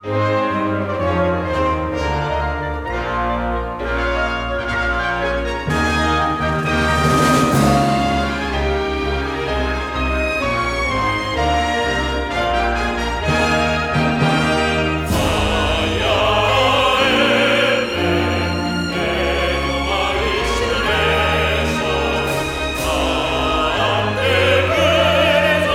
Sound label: Big Chorus; nintendo 3ds home menu themes; Big Chorus Fire Emblem